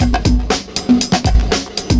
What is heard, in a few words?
PERCS, 70 s Breakbeats